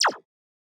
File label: scifi weapon synth blaster